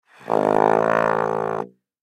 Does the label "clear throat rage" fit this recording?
No